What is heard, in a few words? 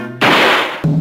hit sound effect; tom and jerry